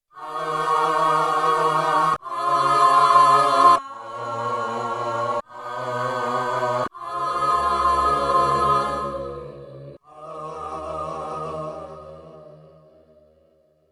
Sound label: Slow Attack Flanger, Pops Voice; Yamaha TX16W